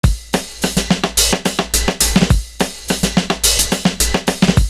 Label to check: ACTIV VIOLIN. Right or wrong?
Right